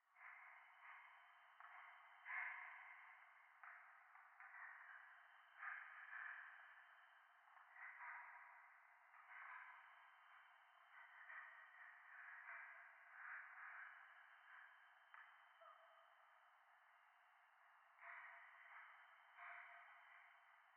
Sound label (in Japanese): 廃墟 左耳快感吐息 山奥